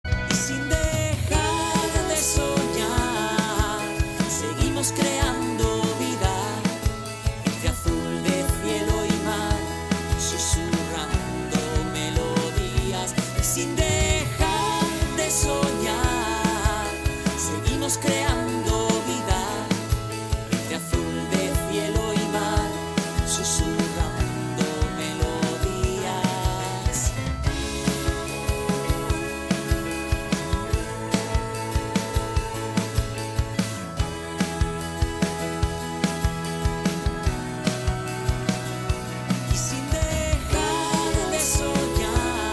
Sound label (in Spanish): Himno Tono de llamada